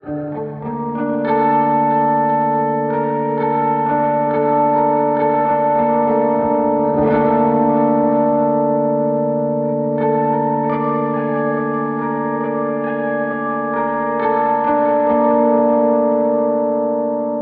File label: G steel